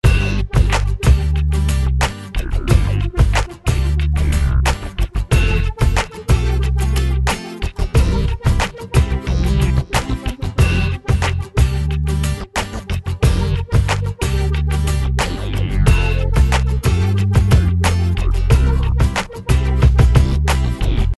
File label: music Funk